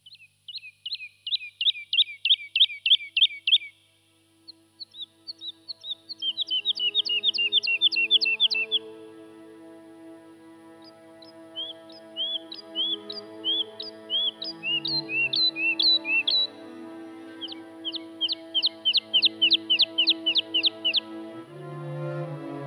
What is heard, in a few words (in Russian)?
muzikalnaya interludiya adazhio